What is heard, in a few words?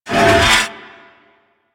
Derelict Mover Malfunction Start; Orokin